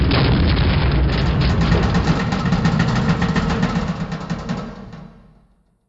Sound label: mortar metal creak